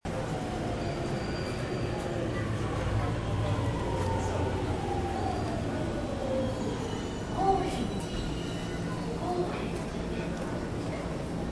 awa odori theme; koenji

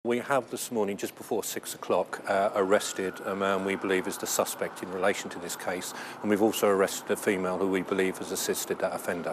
police; surrey

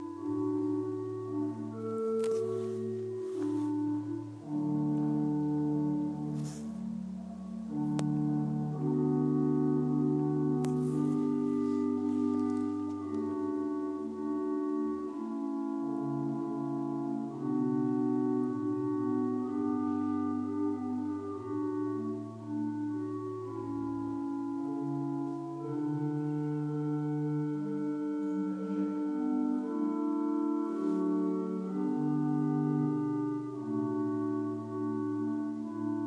organ playing at pulham st mary church

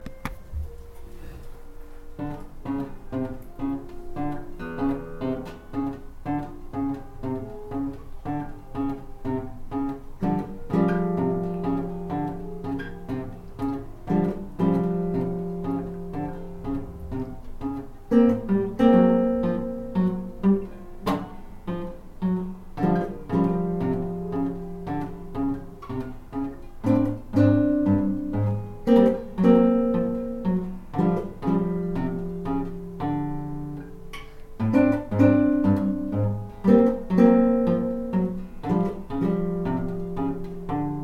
riff

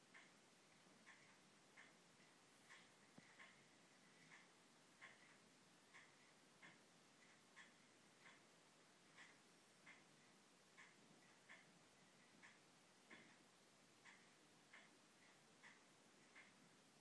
time ticking

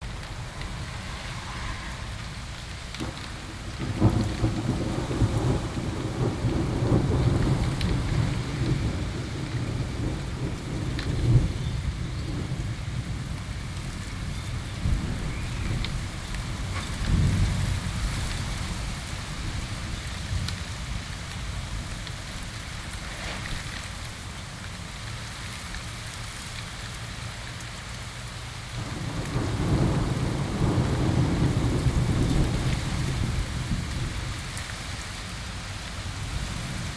thunderstorm Scarborough binaural; ramshill

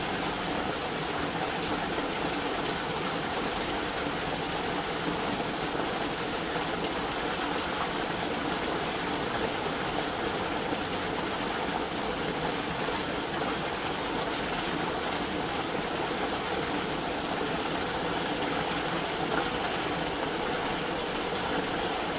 drain chapel hill floods

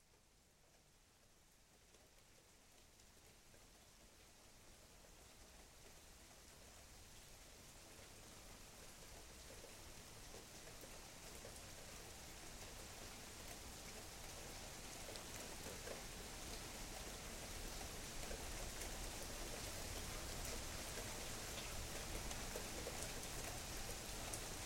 the chimes of canadian rain

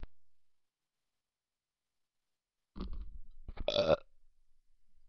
burp

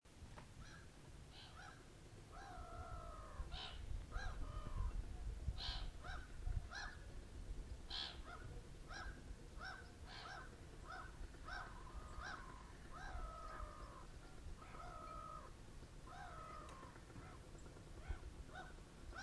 two gulls arguing

